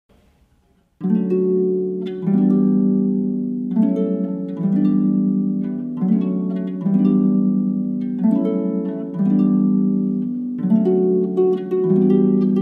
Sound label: harp